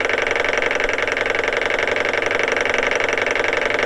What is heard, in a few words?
engine ticking hum; MONO; Vehicles Engines Motors; generator sm; ProSoundCollection v1 3